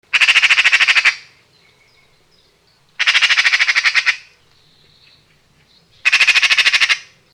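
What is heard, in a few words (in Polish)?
sroka, magpie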